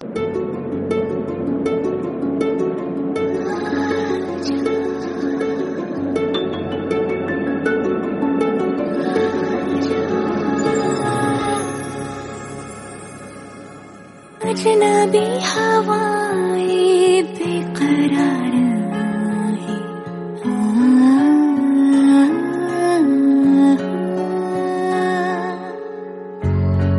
Soft Hindi

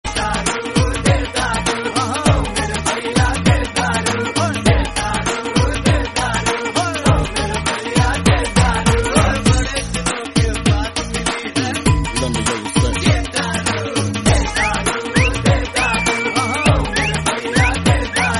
Hindi Tone Ringtone

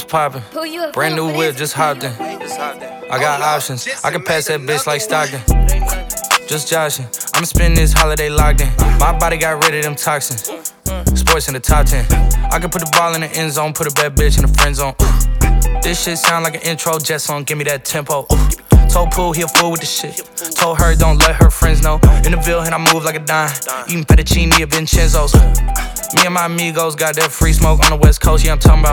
English Song Ringtone

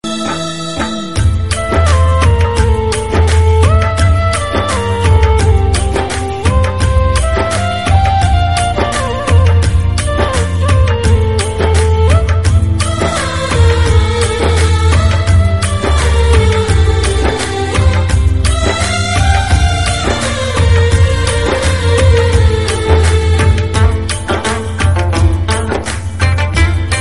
Hindi Ringtone

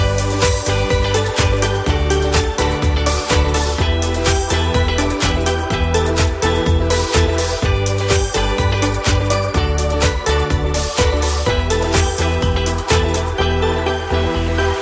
Vivo Ringtone

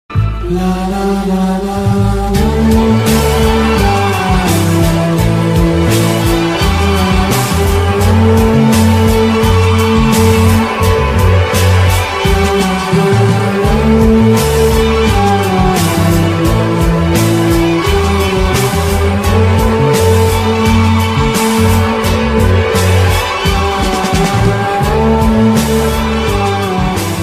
French music ringtone

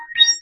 Notification Ringtone; Vivo Y72 5G; [India]

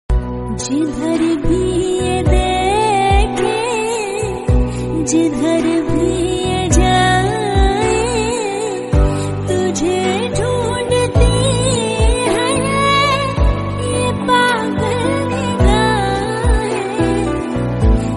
Hindi gana Ringtone

